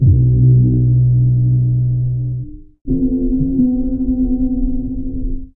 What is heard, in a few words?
bass